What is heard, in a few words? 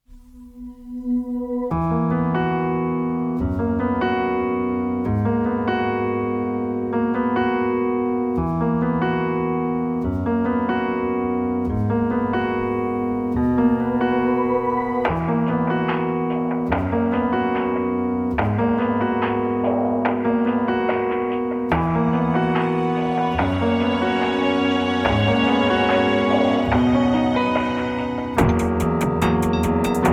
Instrumental